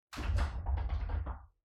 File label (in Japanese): 扉ガチャガチャ